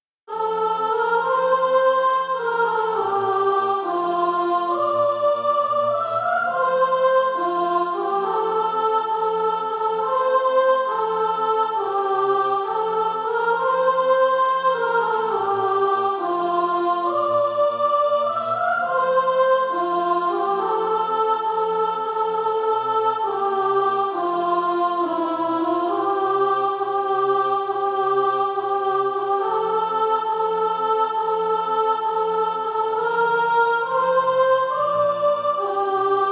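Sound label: soprano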